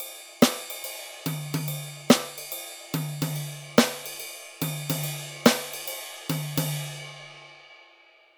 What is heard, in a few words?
swing example